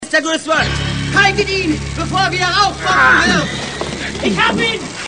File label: aargh